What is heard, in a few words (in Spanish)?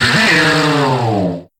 Grito de Hakamo-o